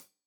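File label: hat closed, hi-hat cymbals stereo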